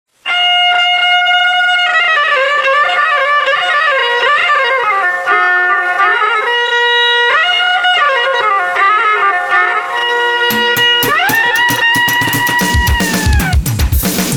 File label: JINGLE; KPU PROV MALUT PILGUB 2024